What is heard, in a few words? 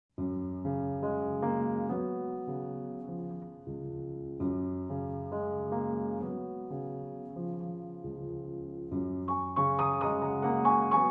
Solo Piano